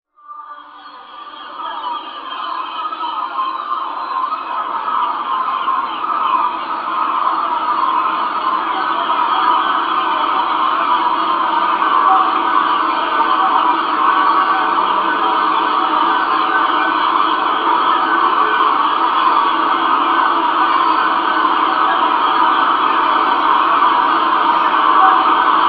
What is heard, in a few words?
Seagulls; Dobby